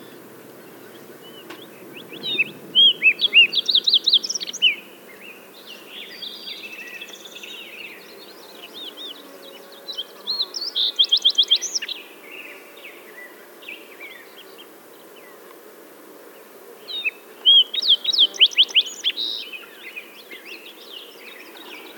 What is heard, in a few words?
Green tailed towhee; پرنده آدم گریز دم سبز